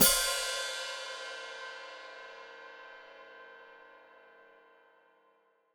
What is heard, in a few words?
Hat Closed Open, Hi-Hat